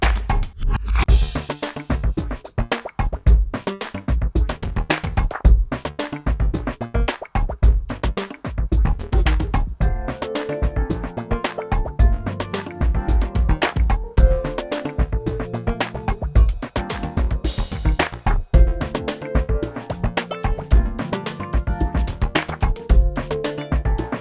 main music; RS Games Sounds; Pig

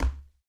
hardwood step; Golden Crayon Sounds

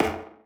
pipe step; Golden Crayon Sounds

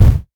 wall generic; Golden Crayon Sounds

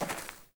grass step; Golden Crayon Sounds

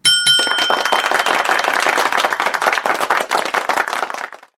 win hand; RS Games Sounds; Apples to Apples

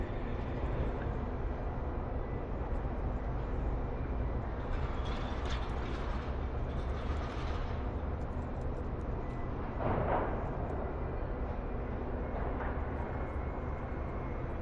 harbor; Golden Crayon Sounds

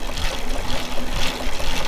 bike loop; Golden Crayon Sounds

